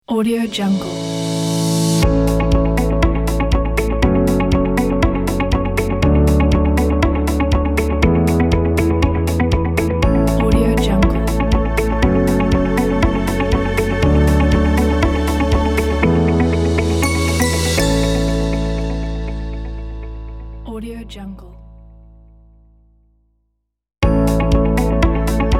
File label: logo corporate; blueearth9